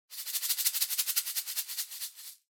Shaker Roll

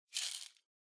Spice Mill Salt Shake

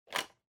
Portable CD Battery Cover Open

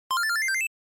NES RPG Magic Cure

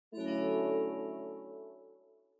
OS Sound (Speaker-Notification)

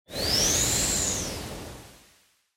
Wind Synthetic

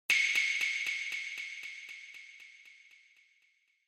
Finger Snap Synth Delay

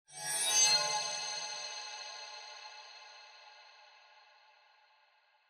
Horror Accent

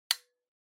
Outlet Timer Stop; タイマー機器

